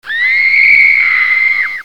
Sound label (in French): Cri scream; bruitage